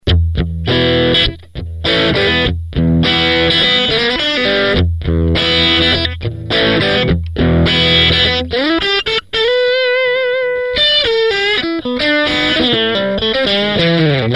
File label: thin; Roger Linn Design